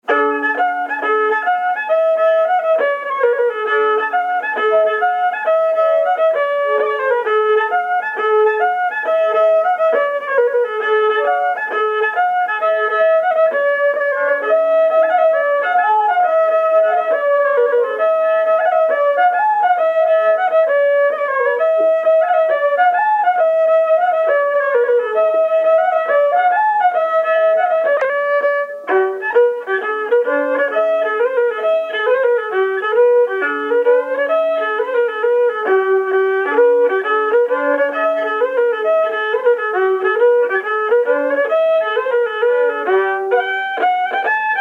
Slide Kerry Slide; Paddy Cronins

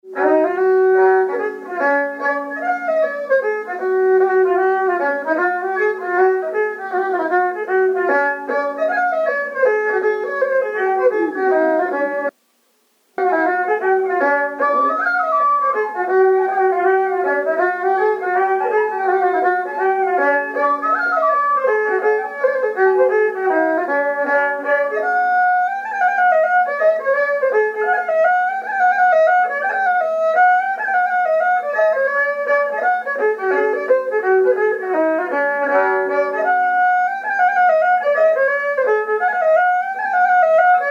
hornpipes; The Blackbird; Nancys

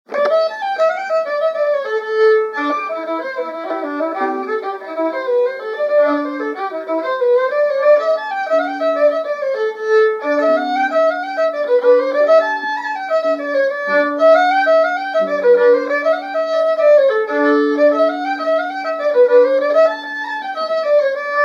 jig; Barna Jigs Nos 1 and 2

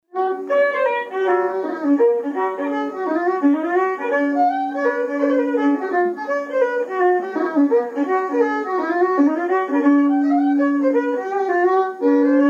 Hornpipe; Sliabh na MBan

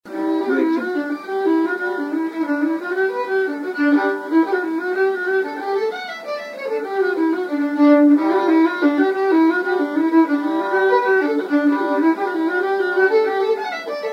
Jig; Walls of Liscaroll

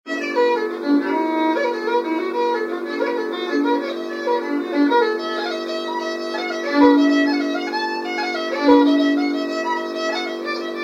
jig; Morrisons

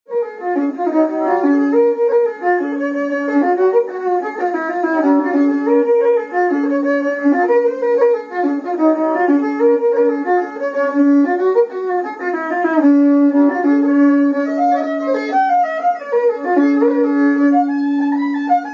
Jig; The Humours of Glen

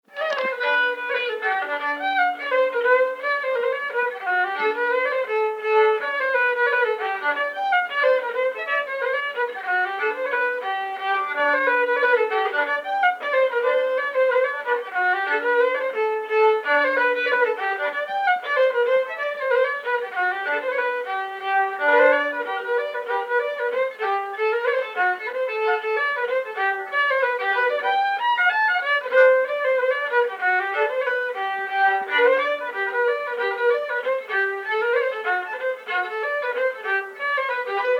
hornpipe; Cuckoos Nest

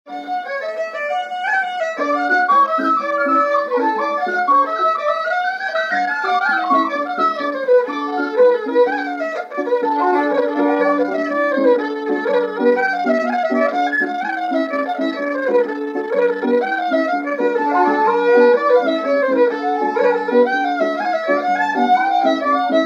jig; Paddy O Rafferty

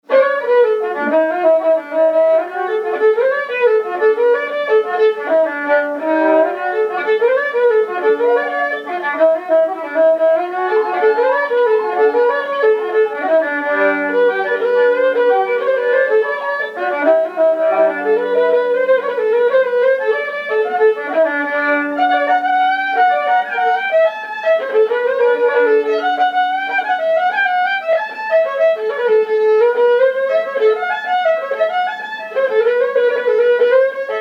jig; Rathbawn jig

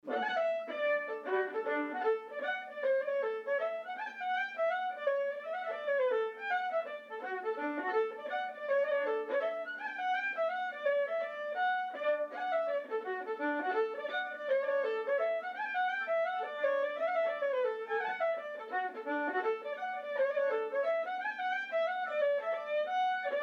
hornpipe; Alexanders

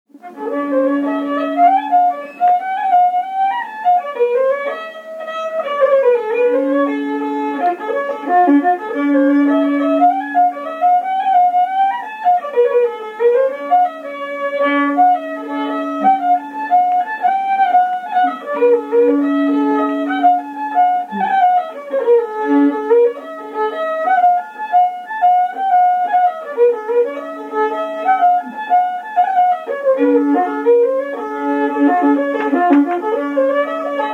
jig; Padraic OKeefes aka Bank of turf

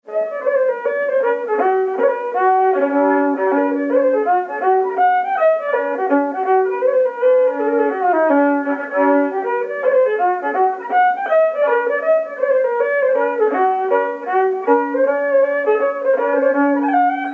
hornpipe; Kilfinane

